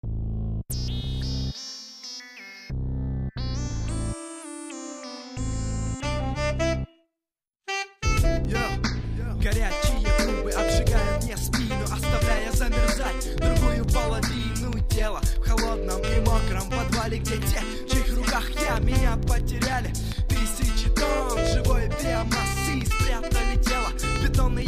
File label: trumpet remix